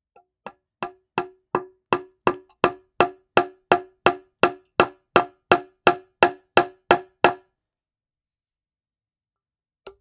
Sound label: Hammer And Nail